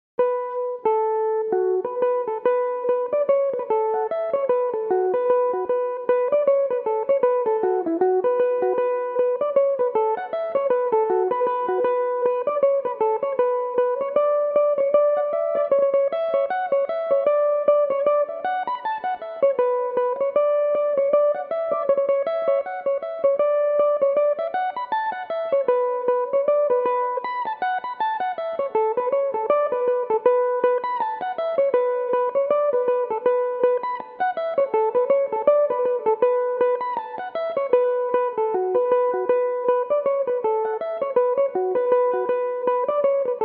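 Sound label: musical priest B minor, learn some tunes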